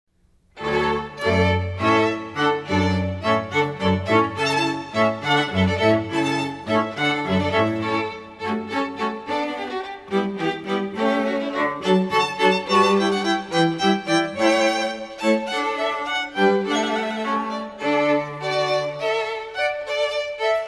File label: Hornpipe, Water Music, Classical String Quartet